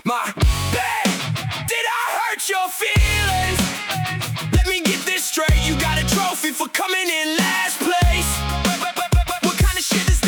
Happy Hardcore

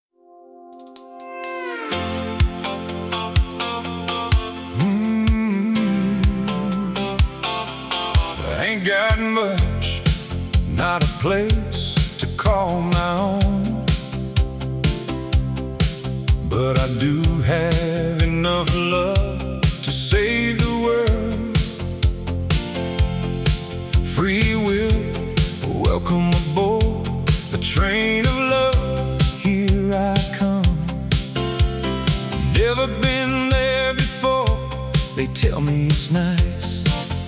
country disco

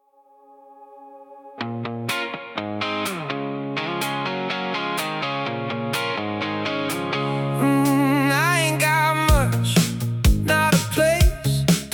indie rock remix

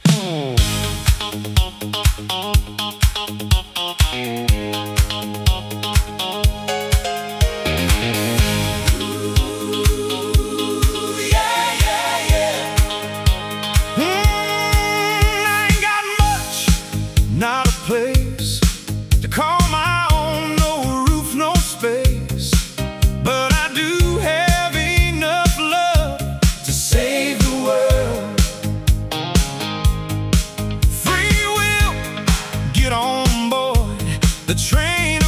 country disco

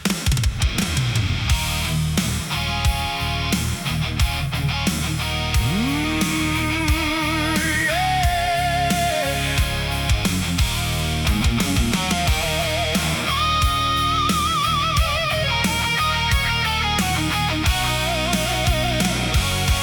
Heavy Metal

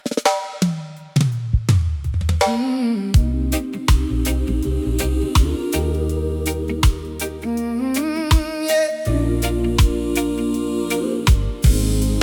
reggae

